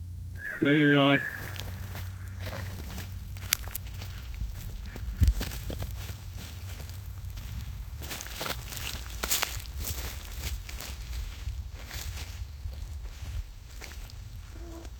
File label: realistic sound of a deer